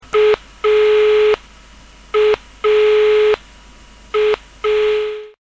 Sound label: slovenia phone pickup